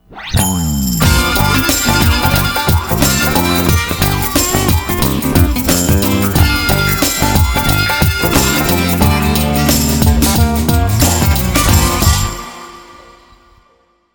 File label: Level Clear; Frogger Beyond; GC PS2 Windows Xbox